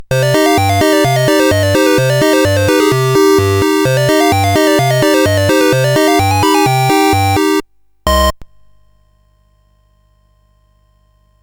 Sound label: Dragonworld sid CSG8580R5